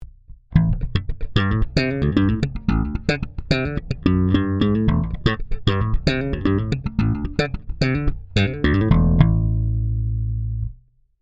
Ibanez EHB1505 Neck Bridge active slap low boost mid cut high boost